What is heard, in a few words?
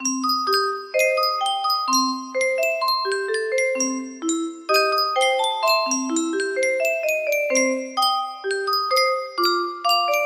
Yunsheng Music Box, music box melody